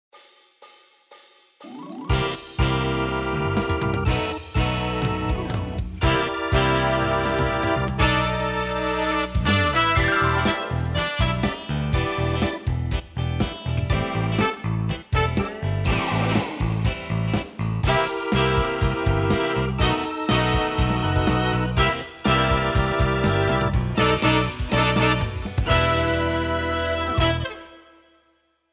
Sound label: Bluesy Rock